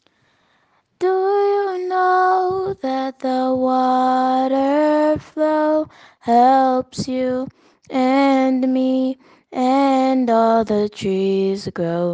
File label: Water Sound